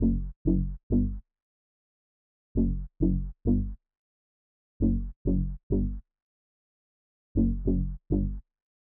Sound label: 100 BPM D Maj Bass, melody loop stem; Cymatics; 2022 Melody Collection; Islands